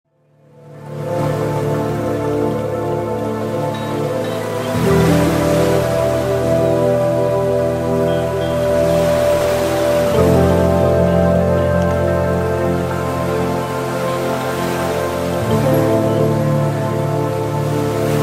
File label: SEA WAVES